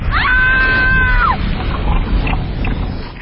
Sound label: female lava death